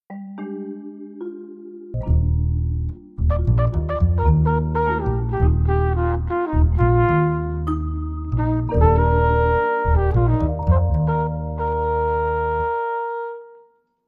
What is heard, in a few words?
With Horn